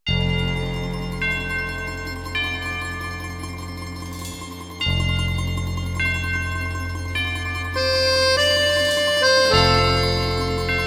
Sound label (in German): Abendglocken